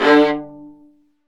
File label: STR, Vas Marcato; STR Vas1 Marcato